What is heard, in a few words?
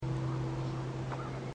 new item; life is strange